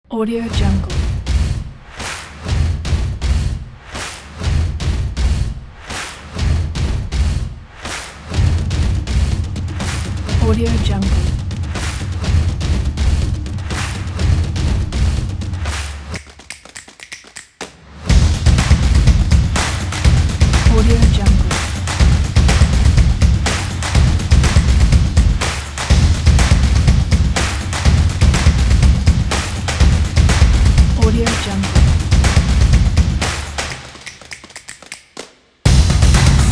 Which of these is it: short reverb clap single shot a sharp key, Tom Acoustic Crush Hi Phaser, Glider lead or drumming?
drumming